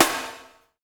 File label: snare need; Roland L-CDX-01; Snares 6; Sn Modules 6